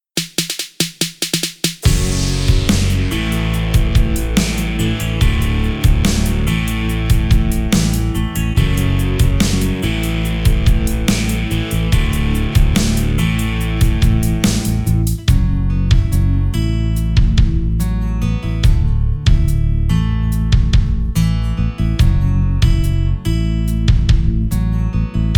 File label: Project Backing Track; Denoised